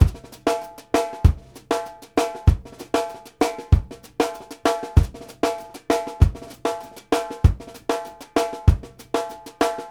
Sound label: UP BEAT, Drumworks; Sampleheads; New York City Drumworks VOL-1